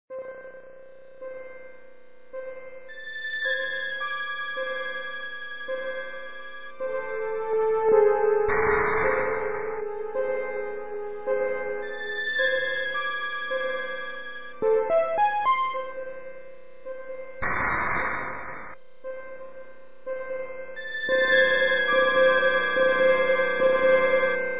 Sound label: Open Start